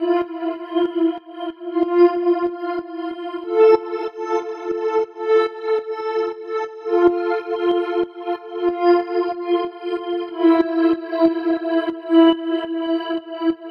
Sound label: 140 F BONUS MUSIC, dubstep; loop; Loopmasters; Jack Sparrow; Deep Dubstep Progressions